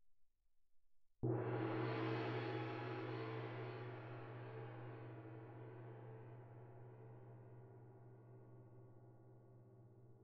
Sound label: 20 wind gong, mf; Percussion; gongs & tamtams